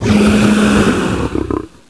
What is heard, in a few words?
Smilodon Dead